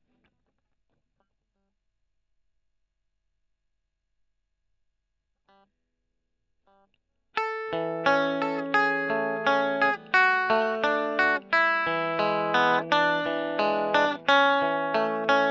E. GTR Gefell

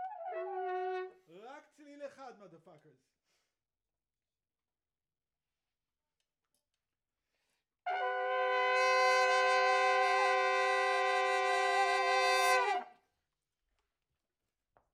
Trumpet Room

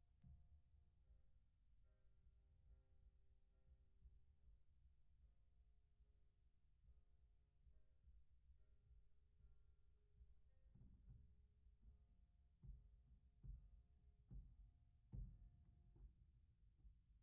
Piano Mono

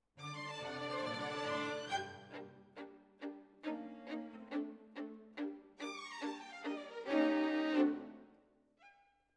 Violins, jazz for piano

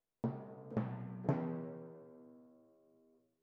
Timp Close

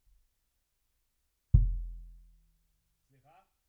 BD Out

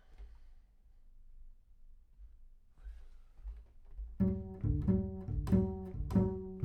Bass Room

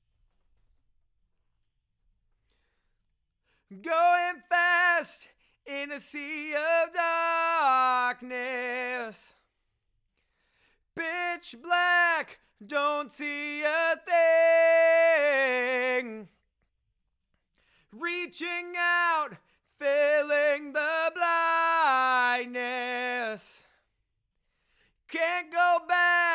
LV Choru

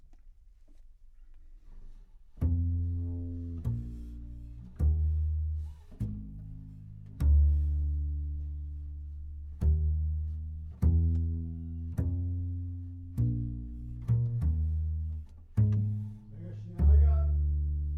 C Bass

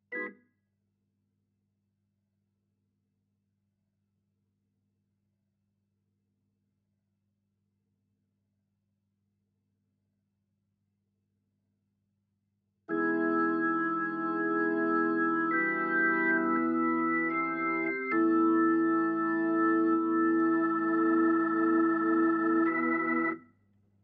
Hammond

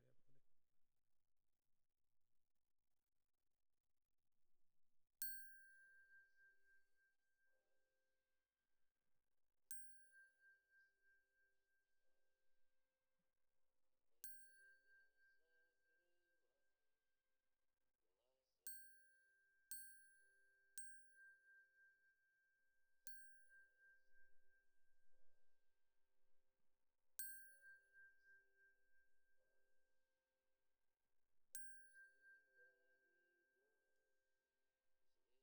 Glock Close

